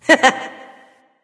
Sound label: "heh heh"; smite; voicepack; nike